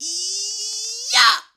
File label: eeeyah female, voice; ooh ahh